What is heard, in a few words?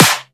clap sound b key; percussion single hits one shots